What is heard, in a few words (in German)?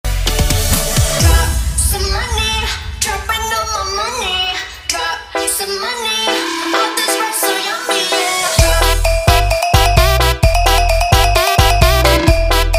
musik